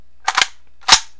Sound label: rifle cock; weapons